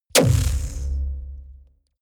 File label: sci-fi sucking implosion; schusswaffen